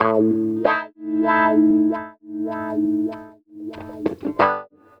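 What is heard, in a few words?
guitar, Roots Funk; USB Soundscan vol.41; AKAI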